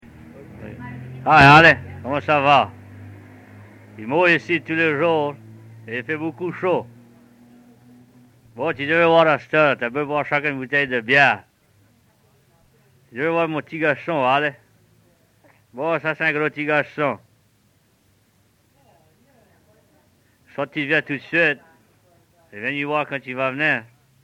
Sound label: Ring